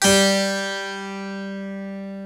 Harpsichord; E-MU Producer Series Vol. 2; More Studio Essentials; Keyboards

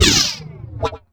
Korg, FX

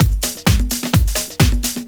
Latin Loop 128 BPM; Best Service; House Definition VOL-1

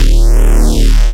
Reese; neuro; BIG5AUDIO

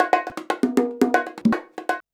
119 bongo; Soundscan vol.56; Modern Percussion Loops; AKAI

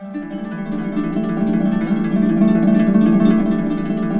E, Harps; Complete Harps; USB Soundscan vol.62; AKAI

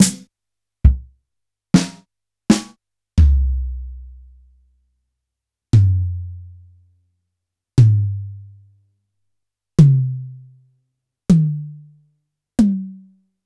Yamaha PSR-E323 Jazz Kit

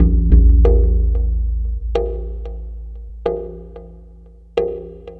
Tribal Wave Drum; Sonic Foundry (Sony Creative Software); Ma Ja Le Ethereal Textures; Worldly Rhythms

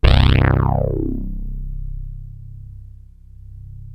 BASSFORM, MORPHEUS

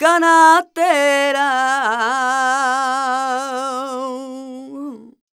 voc c, Flamenco, Vocals, Female Cantaora; ProSamples vol.46

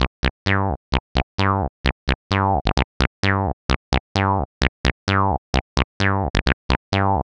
130 Cm bass, bass loops, trance; Trance Explosion Vol1

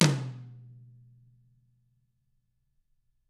TAMA TOM; ILIO; Double Platinum Drums 1

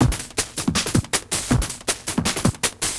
Beat, Industrial

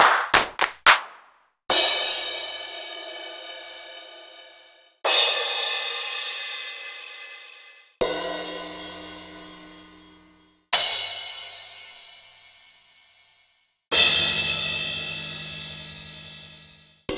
Roland XV5080

